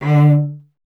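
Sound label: strings cello D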